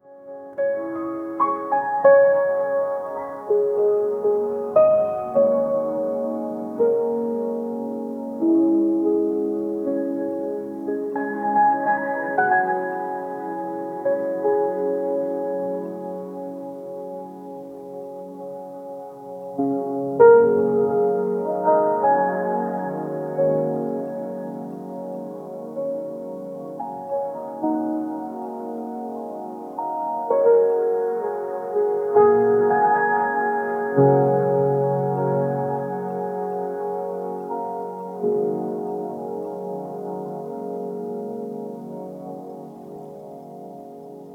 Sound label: muffled felt piano nostal, lo fi